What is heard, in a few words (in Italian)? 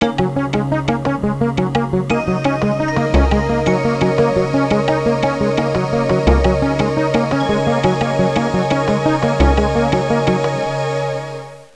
audio suonerie musica mistica